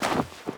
Foot Deep Snow Run; Lucius III

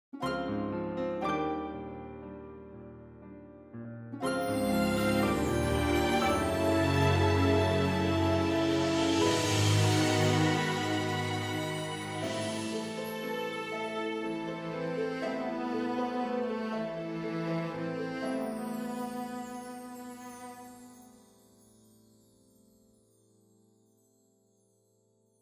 Theme Sting; Agatha Christie Evil Under the Sun; Wii; Windows

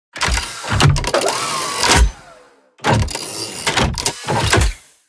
Blazer Idle

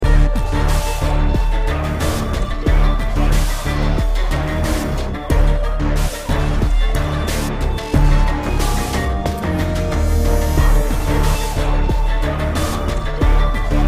Ghost Intensity

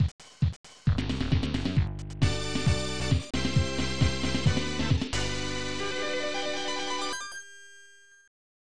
Area Clear; Space Megaforce; Super Aleste; SNES

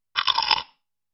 Eating Food Sound; dot hack; Puchiguso

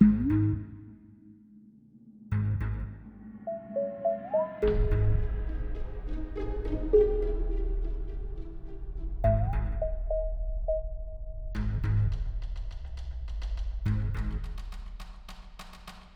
Ghost Calm

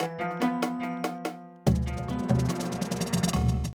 Club Transition to tension; Sifu; Music Hideout2

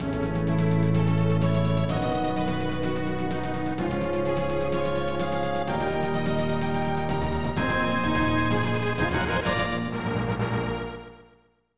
Congratulations!; Inazuma Serve Da; SNES

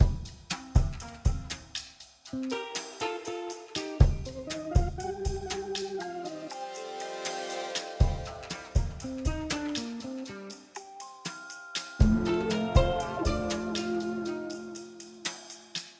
chill music; Cook, Serve, Delicious

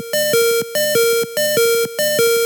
In-Battle Health Low; pokemon; gen 3; attack moves

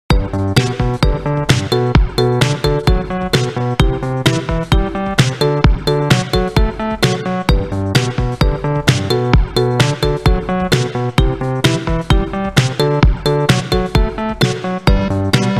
Menu Music; Daily Dadish

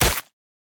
Squished Crush; Boomerang Fu

